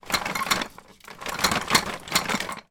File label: toy childs plastic tricycle handling movement